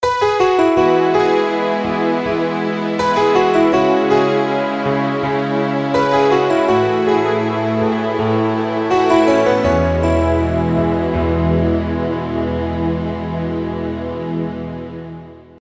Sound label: Piano